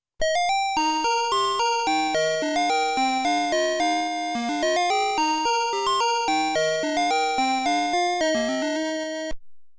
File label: Susanna